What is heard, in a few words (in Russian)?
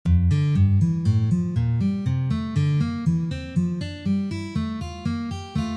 Uprazhnenie dlya igry gammy Re minor